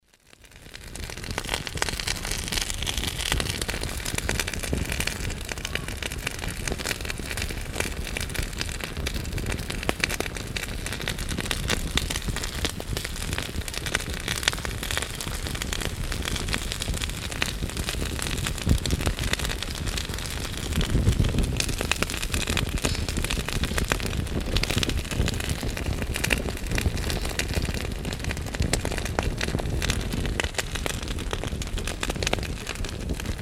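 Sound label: fireplace sounds; zvuki kamina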